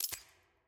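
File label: team push to talk release; premade voice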